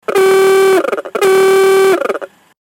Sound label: Auuuuga Wreck Horn Alert